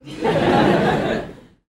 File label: human audience laughter comedy club x200 people